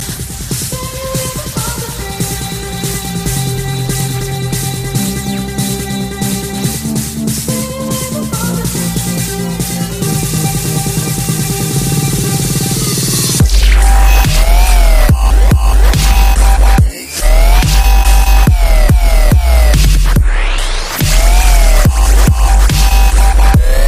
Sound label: broken string ringtone